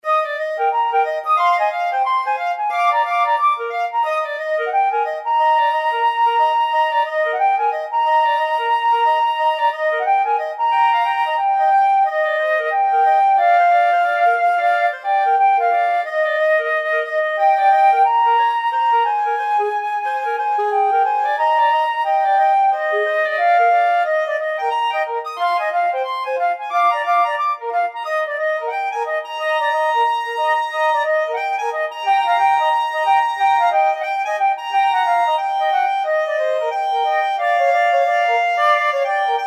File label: flute clarinet duet